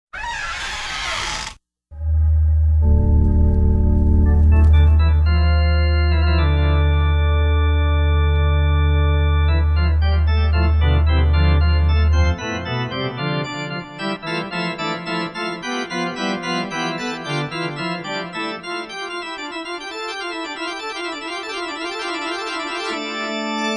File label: cobwebs and organ